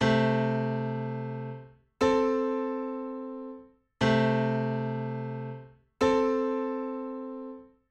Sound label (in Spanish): Intervalo armonico sexta mayor